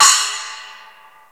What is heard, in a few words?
CHINA CYMBAL, PERCUSSION; Crypton - DrumBase5000